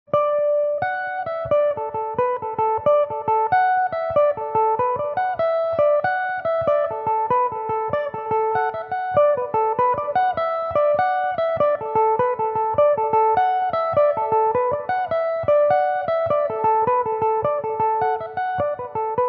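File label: D major, Irish mandolin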